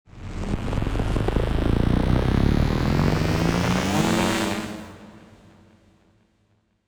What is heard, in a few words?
black hole charge up; dogma